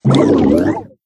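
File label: big slime deploy end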